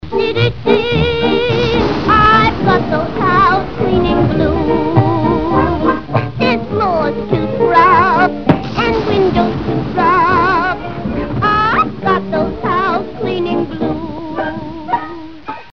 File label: I've Got Those House Cleaning Blues (song verse); Betty Boop